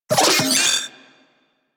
Vocal Alerted; Warframe; Corpus; Mini Moa

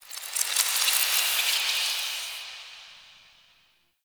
Metal Whispers; Warframe; The New War; Zariman

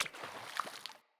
Sandals Run Water, Footsteps

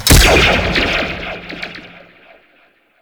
Bolt Zipline; Warframe; Ranger

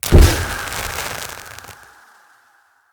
Blaze Armour Impact; Powers; Fenn

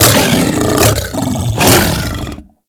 Kubrow Feral Vocal Leg Grab; Warframe

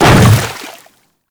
Hammer Boss Step Water, Footsteps

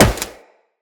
Wall Jump Metal, Footsteps; Wall Run; Characters; Tenno

